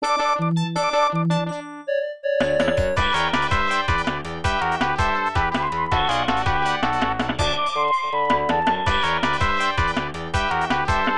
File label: Ending BGM; Pokonyan; CP System; arcade